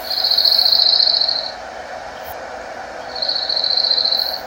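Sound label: Dark eyed Junco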